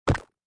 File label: Multimedia, Blip, Blurp, Button, Menu, Rollover, Web Page Or Flash Animation Sound; Beep